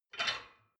dishwasher plate grab

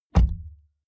car bmw e46 interior door front close; Cars

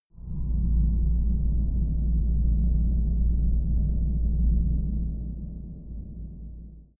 space shuttle slow motion; raumschiff

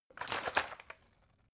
bottle water big shake single; berufe

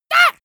monster assault high; schreie

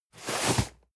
cardboard box medium slide hard; objekte

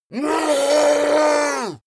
yeti attack shout aggressive painful; schreie

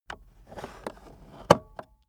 car accessories cup holder push in slow

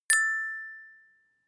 notification glockenspiel fourth

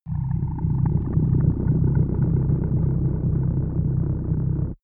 scavenger ship

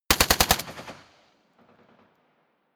rifle ak-47 exterior fire burst light; Firearms; schusswaffen

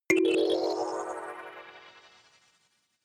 notification kalimba magical second

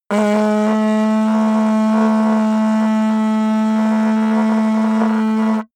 mouth fart; soundpool

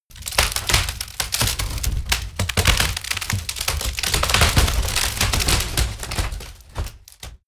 wood break large; berufe; foerster

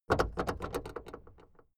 car camaro open trunklid long

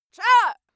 female attack

